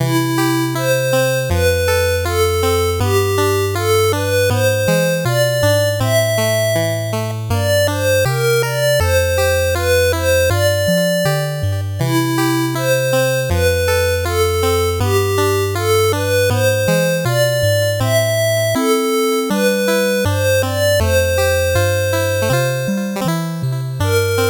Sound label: 8bit bgm slow